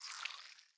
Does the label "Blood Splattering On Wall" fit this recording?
Yes